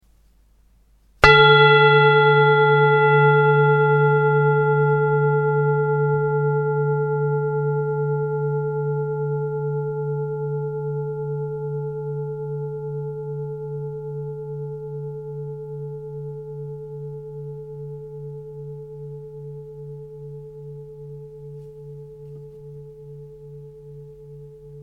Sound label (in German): Klangschale